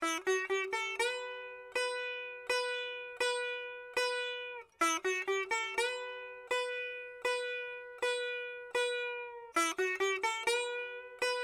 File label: sitar